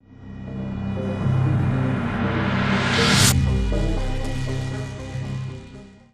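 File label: stinger horns; transformers war for cybertron gamerip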